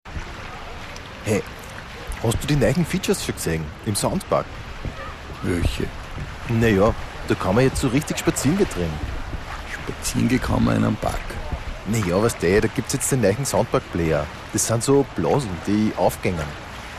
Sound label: jingle